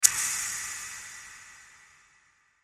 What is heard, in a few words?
Hit Airy Reverb; Sound Design